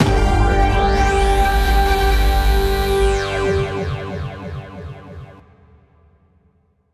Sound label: Transition; Cooking Dash; Zero-G Pizzeria